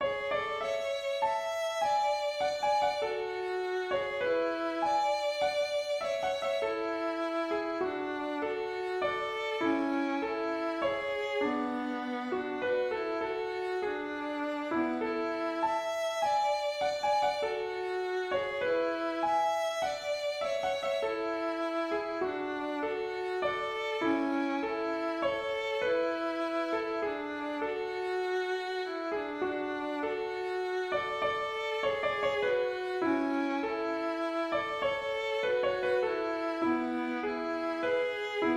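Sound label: waltz, melody and 3rds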